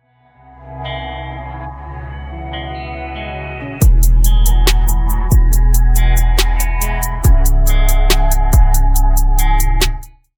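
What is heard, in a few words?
instrumental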